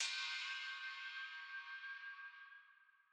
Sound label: Drum Loop - 195 BPM Crash; Loop Stems; Cymatics; Behind Drum Loop